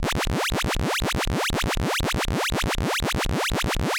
LFO Saw 2 Serial

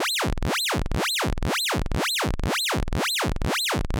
LFO Sine

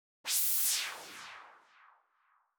Logic Pro X Alchemy White Noise

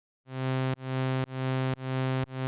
Alchemy MSEG sine

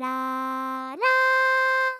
portament default

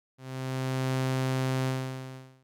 Alchemy AHDSR sine

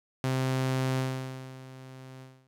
Alchemy AHDSR sine

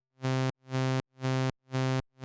Alchemy MSEG sine

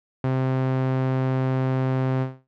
SAW LP CutOff 1800Hz; Alchemy

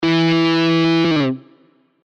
release slide

